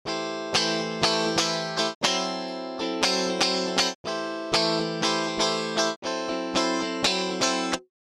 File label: Strat dry; real Strat